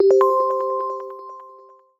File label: Delay, notifications; Honor; 600 Pro China